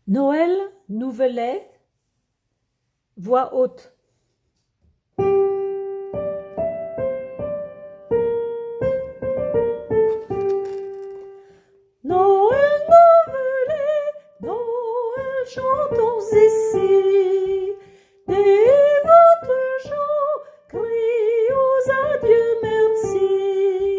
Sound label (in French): voix haute; noel nouvelet